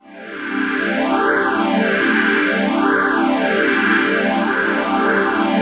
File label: PHASE PAD, ATMO PADS; Best Service; XX Large